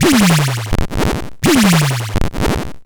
LFO, Noise

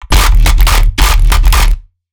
Eb CRUNCHY BASS

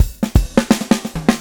SWNG, SWNG SHFT

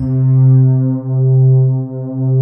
MUON PADS; Pro-Rec - Synthsonic

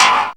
Perc FX, Percussion; Dance Synths

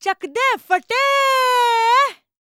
VOICE, EASTERN FX; ILIO; Vocal Planet

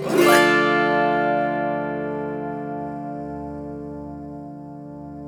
AUTO HARP, Auto Harp Min 7

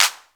808 HANDCLAP; Crypton - DrumBase5000; CD DRUMS #2

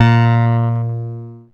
A#, PIANO; Big Fish Audio; Synclav FM

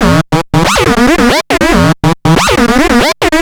Analoop, Industrial

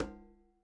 KILLER CONGA

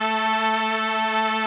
SOLINA, String Machines

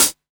DDD-1 Hat Closed, drum kit; Polyend; Stazma & Concrete Collage; Concrete-DDD1

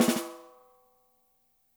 dry, snares; Spectrasonics - Burning Grooves CD2

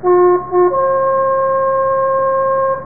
Horn; warlords; ST-XX Amiga Soundtracker Sample Packs

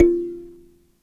Dyna Sansa, Africa; Roland LCDP11 Africa VOL-1; Sansas

